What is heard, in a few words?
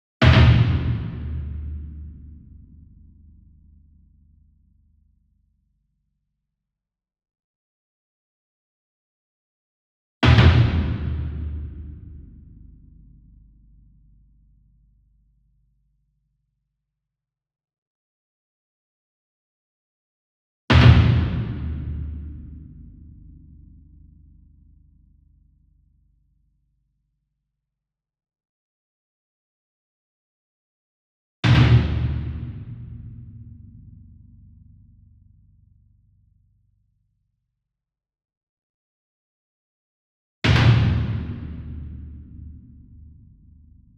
SINGLE DRUM Bass Drum Concert 40 Inch Double Hard Beater Far; boom library; Cinematic Strikes Construction Kit